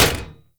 Short Sword Medium Impact Dmg Metal; Weapon Impacts; Player; Swords; Combat

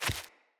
Pack Wolf Step Single; Animals; Footsteps

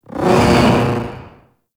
Air Bombard Projectile Whoosh; Warframe; Venus

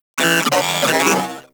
Vocal Attack; Moa; Vocal Set D

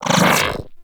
Bullet Attractor Cast; Warframe; Mag

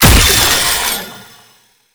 Void Heavy Scythe Crystal Embed; Warframe; Duviri; Incarnon Adapters; Melee

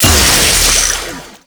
Blast Cast; Warframe; Captain Vor; Abilities Redux

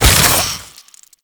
Modular Secondary Barrel Loop End, Weapons; SU Modular Guns; Barrels

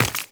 Run Flesh; Footsteps; Warframe; Tenno

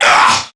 Pain Crewmen; Warframe; CorpusCrewmen; CrewmanA

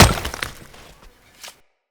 Great Sword Melee Impact Stone; Weapon Impacts; Player; Swords; Environment; Founders Great Sword

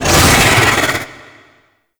Golden Maw Attack Vocals; Ambience; Gameplay; Warframe; The War Within

